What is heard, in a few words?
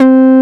JV 101 BASS, JV-1080; Syntec; Wall of Sounds